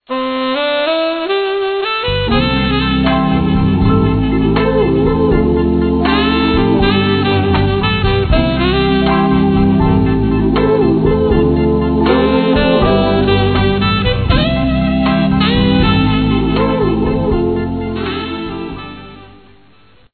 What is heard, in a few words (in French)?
chanson d'amour instrumentale